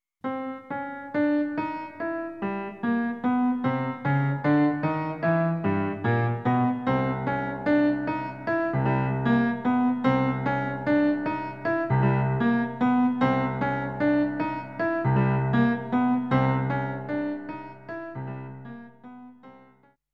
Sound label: Slot Stage BGM; Tanoshii Beyer Heiyou Super Mario World complete music collection 1993